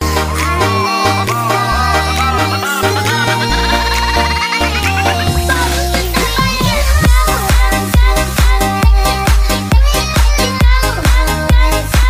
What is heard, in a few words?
winning song